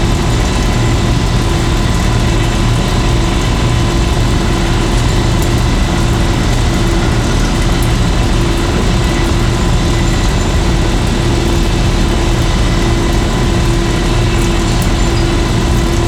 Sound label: Ball Hall Gears Loop